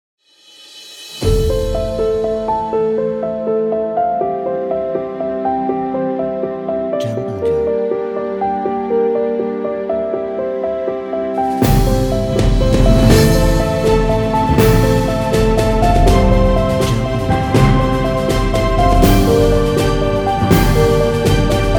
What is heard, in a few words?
Inspirational Cinematic Trailer